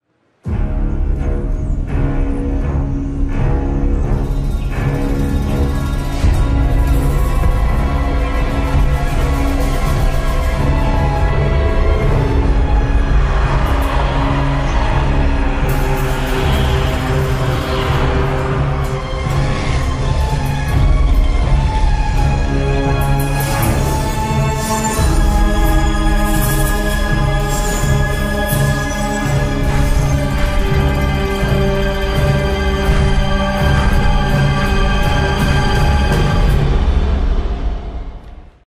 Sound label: Music; AtalDazar; WoW Battle for Azeroth; BlizzCon 2017